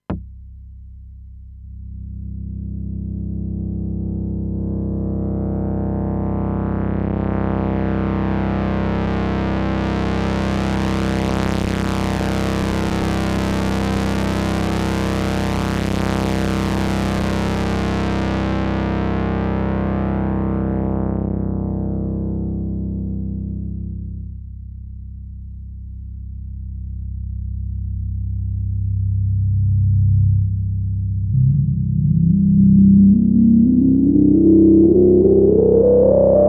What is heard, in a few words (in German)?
Low Pass Filterfahrt